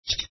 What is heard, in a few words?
chip